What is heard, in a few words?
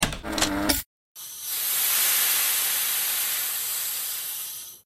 Turn Special Key